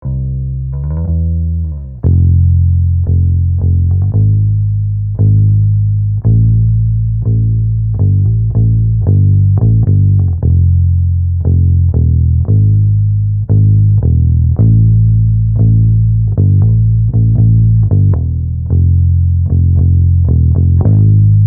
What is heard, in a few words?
UA1176LN Hoefner Bass Ampeg U87